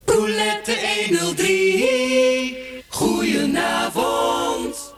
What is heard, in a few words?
silence